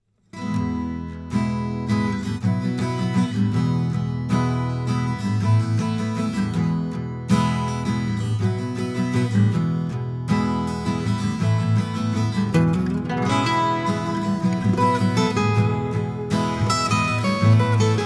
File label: Acoustic Guitar; Scarlett 4i4; focusrite